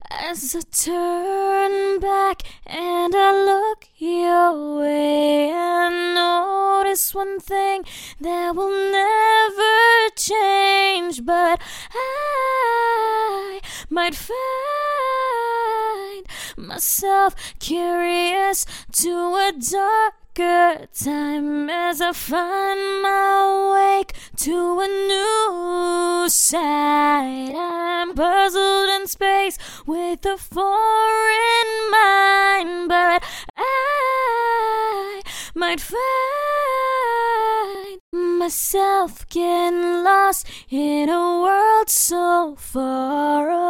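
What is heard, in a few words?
pop 122 G minor, Acapella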